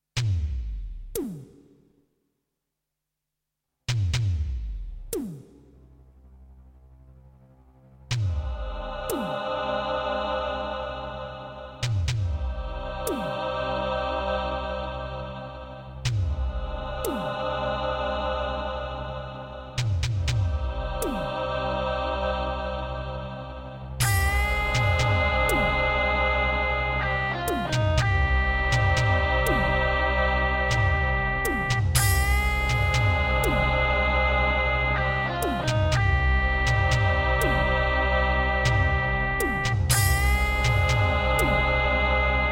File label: Midiplus MiniEngine USB; Doom - E1M8